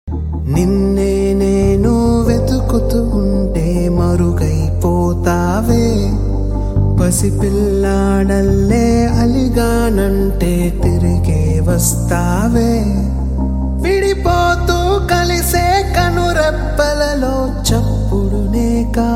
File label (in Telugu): telugu sad love song